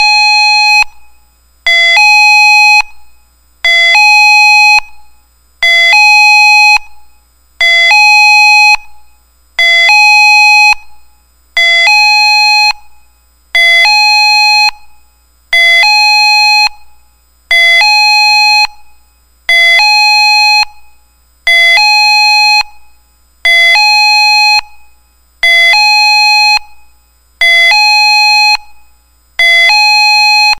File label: special warning